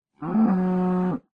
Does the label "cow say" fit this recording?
Yes